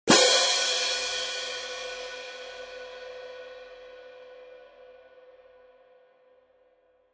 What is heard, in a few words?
18in Symphonic German Tone Pair, cymbal sound